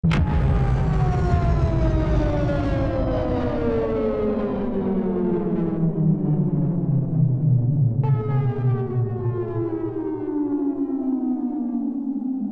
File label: Dive Bombing